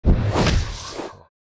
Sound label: Sci-fi weapon proton bomb explosion